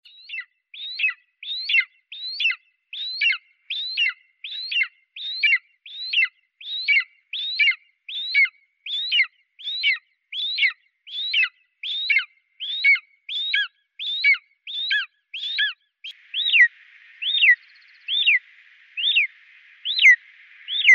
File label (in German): steinadler